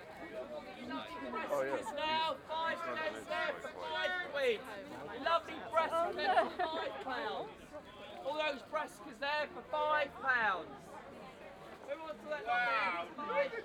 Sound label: open air market, scenes; IEEE AASP